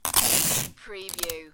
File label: tape dispenser